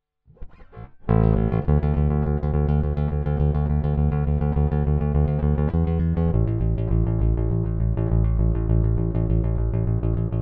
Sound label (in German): E Bass; MOTU 828ES